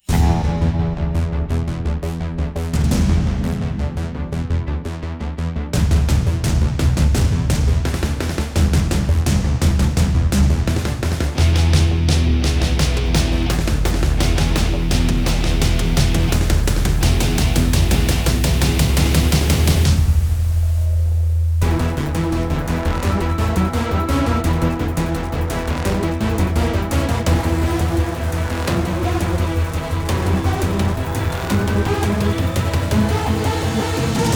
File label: Battle Loop